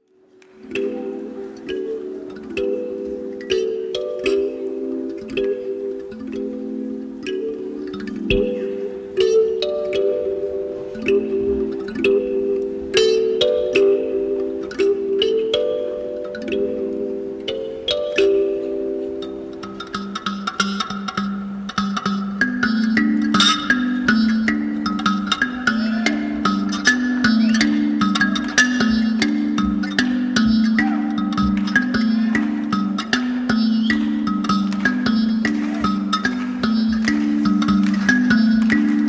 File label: Kalimba; World Music